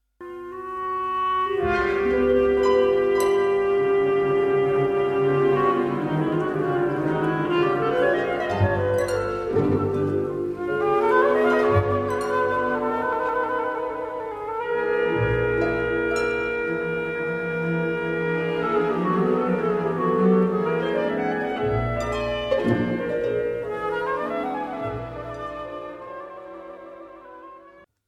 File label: impressionist